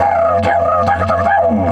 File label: F 140, DIDGE RIFFS